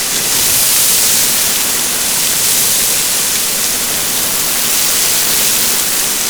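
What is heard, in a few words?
water hydrant spray loop; Explosions; Call of Duty Modern Warfare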